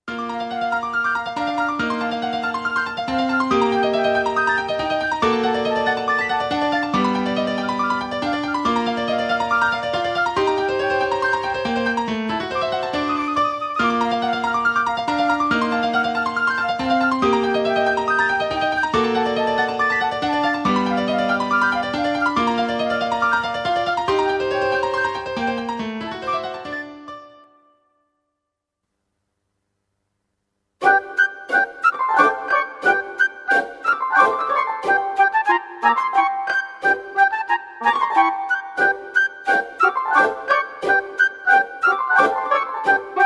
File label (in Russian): Polet ptic; kluut